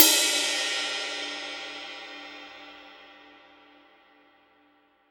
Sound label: RIDE, Drum Dry; East Collexion; Drum 1 Dry